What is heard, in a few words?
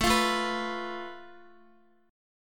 A Suspended 2nd Sharp 5th, Guitar chord; strummed; JGuitar